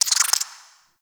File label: Insect; Liset Alchemy Lab